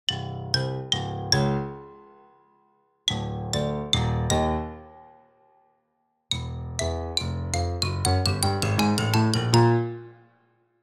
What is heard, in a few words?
comedy sneaky sinister music piano xylophone; sound effects one